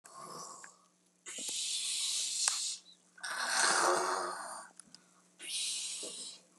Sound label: snoring